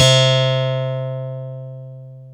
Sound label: HARPSIC C3